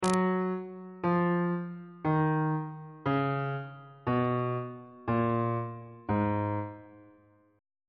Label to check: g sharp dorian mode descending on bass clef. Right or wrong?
Right